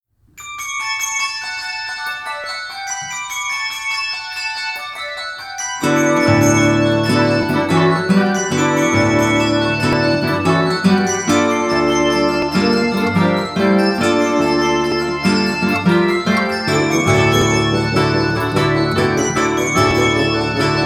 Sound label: Guitar